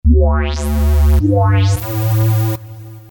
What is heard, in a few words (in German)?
Puls Step